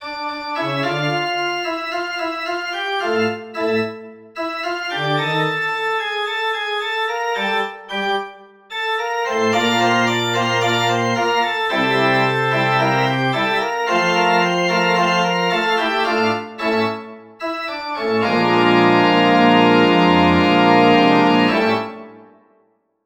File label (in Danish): forspil håbstango